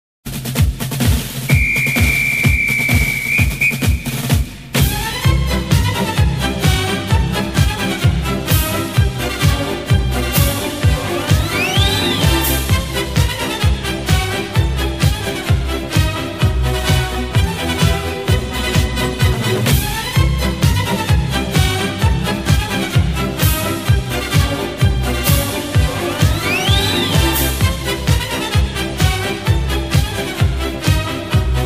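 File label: sounds of fanfare and a solemn awards ceremony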